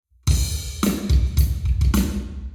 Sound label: Hall Drums 90deg